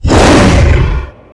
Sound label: shout short